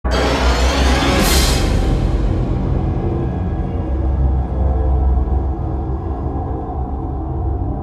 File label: SHOCK; F.E.A.R. Perseus Mandate